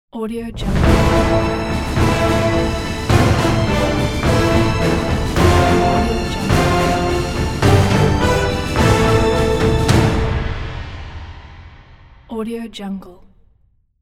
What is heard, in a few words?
majestic knight victory fanfare